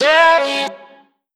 Vocal one shot C#m; Slate Digital Omnivox Vocal Sample Pack

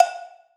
percussion oneshot oysters; Pop; Drums; SD PASADENA Pop Sample Pack; Slate

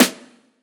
snare oneshot, Pop Sample Pack

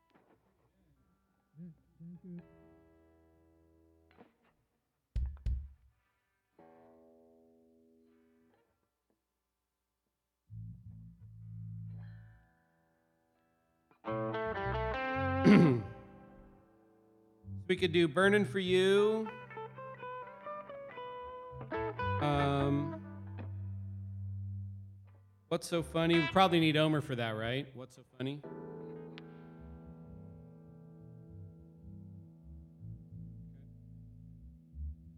Vocal Emphasis Mix

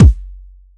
Kick, 909 Kicks

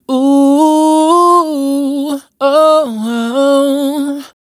riff ooo oh 103 Bbm, Dry Vocals; Raw Vocals; Slate Digital Omnivox Vocal Sample Pack

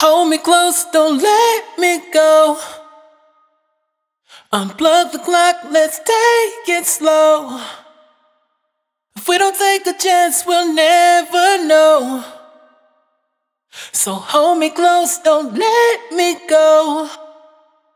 hook Dont Let Me Go 100 Eb wet, Vocal; Slate Digital Omnivox Vocal Sample Pack

